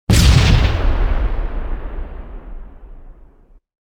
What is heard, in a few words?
other hit, ships combat; CosmicRage; CosmicRageSounds